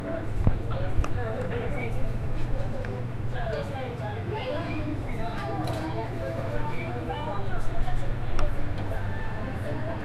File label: background sound people; social